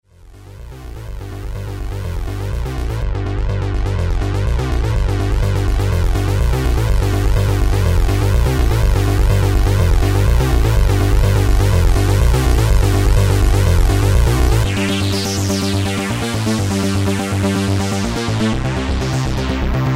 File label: synth